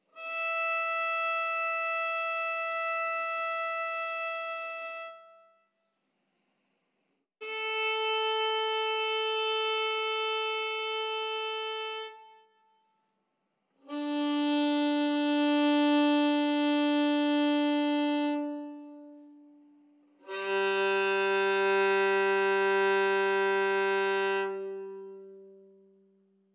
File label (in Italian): accordatura